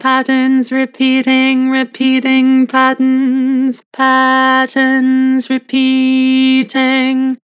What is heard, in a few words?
Vibrato, Vocals; Patterns repeating; Harmor Vocals